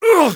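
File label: pain sharp, German; Sniper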